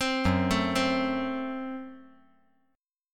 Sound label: F sharp Diminished; F#dim arpeggiated